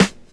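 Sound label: snare drum 70s, drum kit; E-Lab; X Static Goldmine 2; akai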